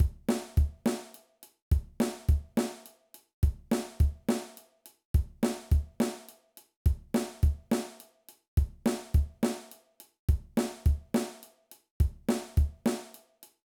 Groove 68 Drum Beats

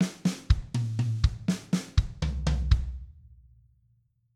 Triplet Fill, drum

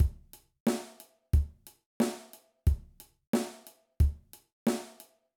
Basic Beat Example, drum

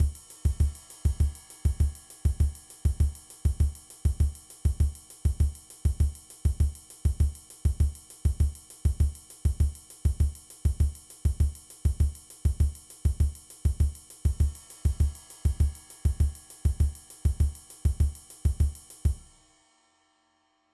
Samba Beat

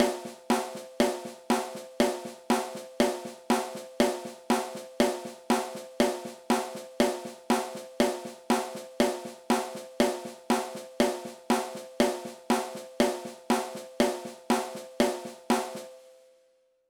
Moeller Exercise; Moeller Technique; drums

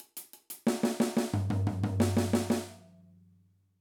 16th Note Example

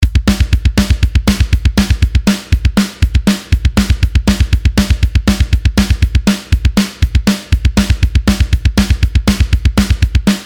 Double Bass Beat, drum

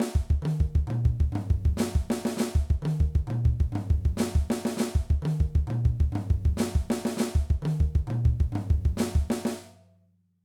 Flam Drum Fill

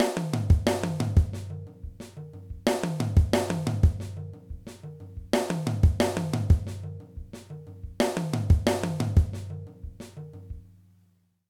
Dynamics Ex

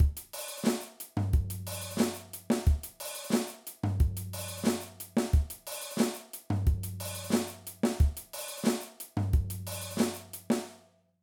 Intermediate Drum Beats

